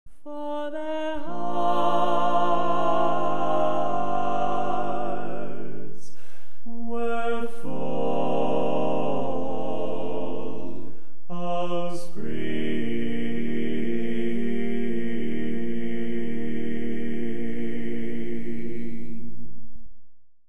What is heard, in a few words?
Tenor, barbershop